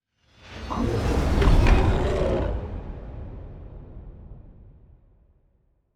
ship cruiser move; ships; Stellaris; shroud

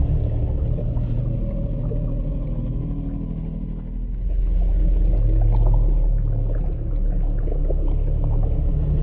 void worms juvenile idle; Stellaris; grand archive; ships

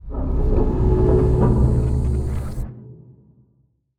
juggernaut necroid move; Stellaris; necroids

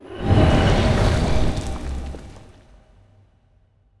necroid moving out science; Stellaris; necroids

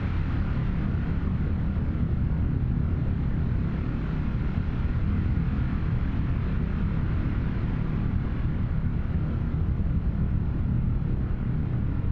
ship colony ship idle; Stellaris; machineage

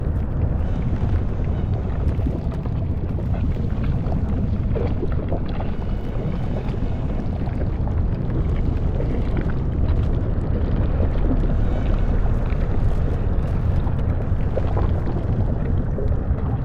void worms nest idle; Stellaris; grand archive; ships

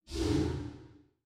space dragon pulse; Stellaris; guardians

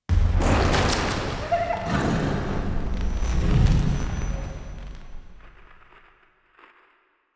event first contact aquatic; Stellaris; aquatics

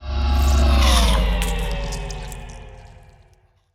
ant ship juggernaut move; biogenesis

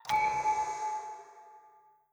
tradition available; Stellaris

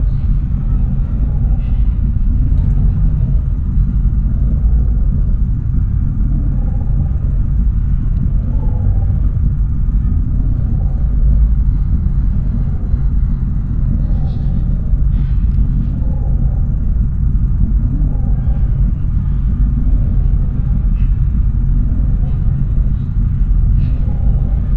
plantoid colossus idle; apocalypse

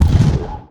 flak weapon fire; weapons; Stellaris

